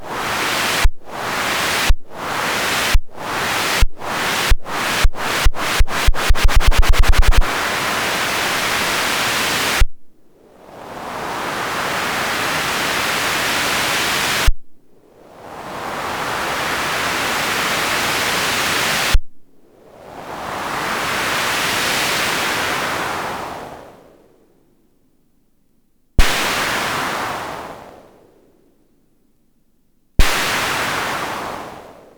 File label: Noise throgh VCA; NOISE TOASTER